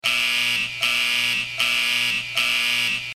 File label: buzzer